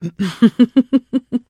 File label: trader laugh; 商人杰恩（七日杀）